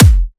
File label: Dubstep Kick; Ghosthack; Dubstep Sound Arsenal